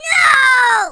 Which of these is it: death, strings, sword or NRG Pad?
death